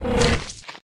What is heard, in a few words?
Bite Player